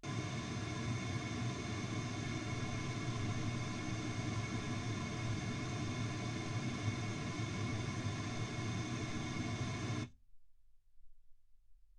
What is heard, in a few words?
pink noise 48kHz